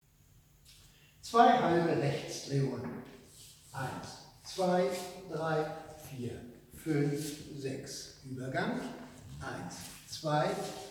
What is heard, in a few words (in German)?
Langsamer Walzer Basic